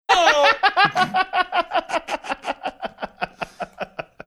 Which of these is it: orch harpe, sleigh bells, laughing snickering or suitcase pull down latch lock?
laughing snickering